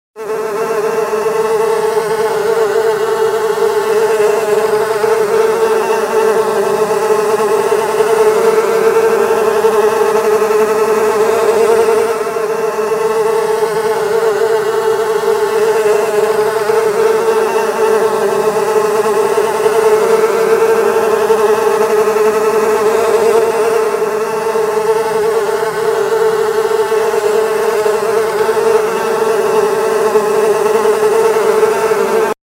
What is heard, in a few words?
flies; plague